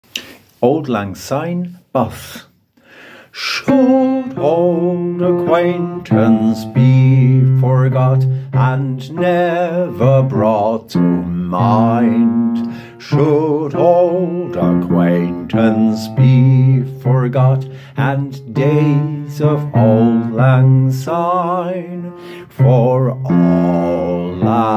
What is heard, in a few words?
Bass; Auld Lang Syne